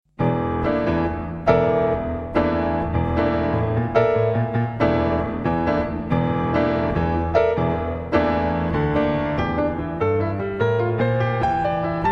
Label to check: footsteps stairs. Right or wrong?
Wrong